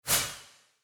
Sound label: roll